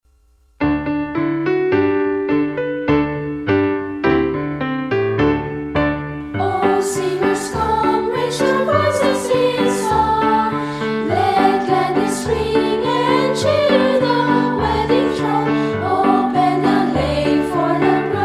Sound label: Mindanao Folk Tune